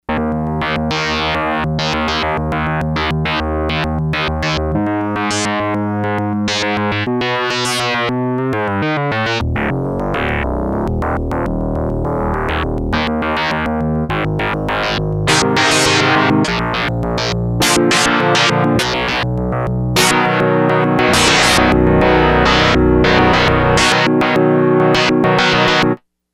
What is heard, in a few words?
S-H Synth, Yamaha DX100; Factory Patches